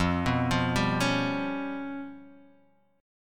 G 7th Flat 5th

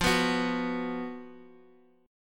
F sharp Major 7th Suspended 4th

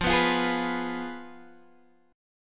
F Diminished Guitar DADGAD

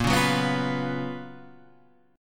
Gb Major 11th

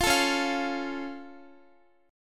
Db Augmented Db down Guitar; strummed

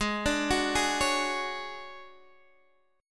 D Major 7th Flat 5th G sharp, chord

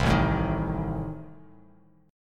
A Diminished Bass Standard; Adim strummed; 8,0,7,8; JGuitar

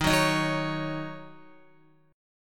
D sharp Minor 7th, Guitar; strummed; JGuitar

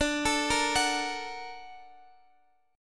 Bb Augmented Major 7th D

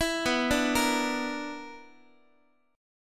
B-Minor Major 7th Double Flat 5th, Guitar; BmM7bb5 arpeggiated; JGuitar

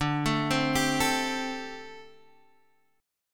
D 7th Suspended 4th D Guitar Standard; arpeggiated; JGuitar

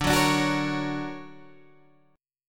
D Augmented Major 7th down Guitar Standard; D+M7 strummed; JGuitar

